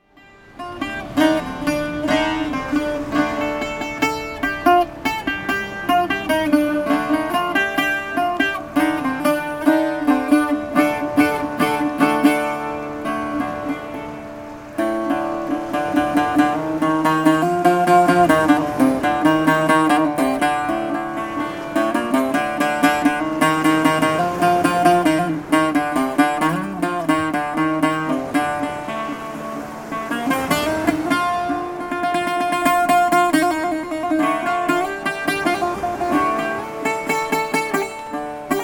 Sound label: Modal Music in Turkey